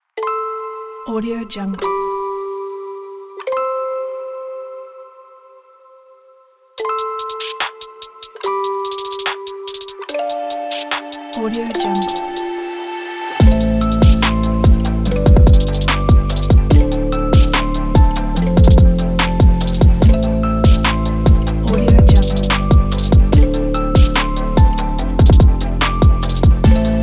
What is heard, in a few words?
fashion summer lounge hip hop